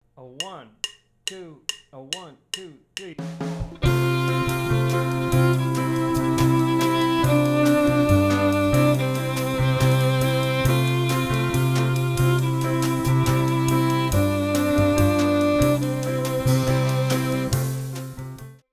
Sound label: Play Along Inner Melody In Whole Notes